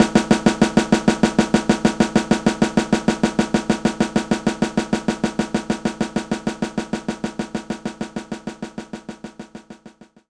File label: Jazz Snare Soft